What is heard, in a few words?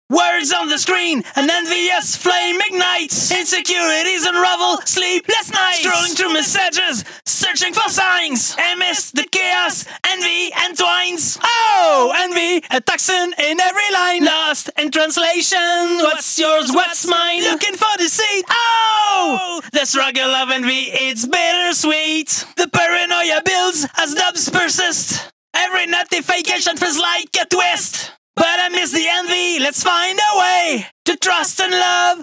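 hip hop pop 170 F minor, Acapella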